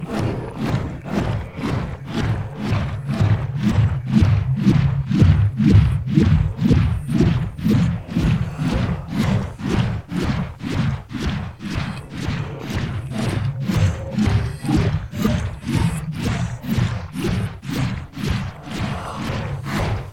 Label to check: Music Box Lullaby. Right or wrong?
Wrong